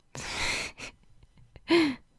soft giggle

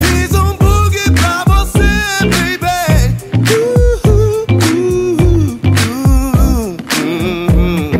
music

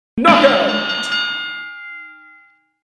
knockout

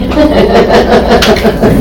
laugh ugh; ATLAS CARLA LAUGH

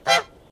honk

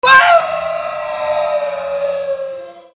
weeeooww long

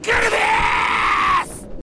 grrriiiffffttthhhhh

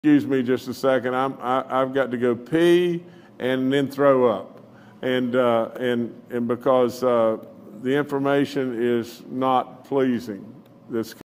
piss and puke

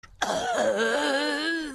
cough; poor violet

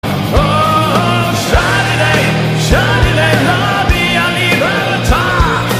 metal

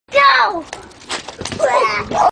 bwah

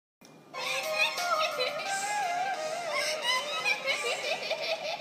laugh; kurumi

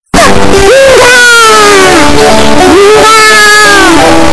cute anime roar bass boosted

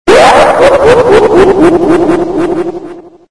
laugh echo remix; sonic.exe

